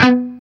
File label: CHUG B 2 R, Guitar; East West; Steve Stevens Guitar Samples Collection